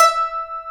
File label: MANDOLIN E 4; AKAI S-Series CD-ROM Sound Library VOL-7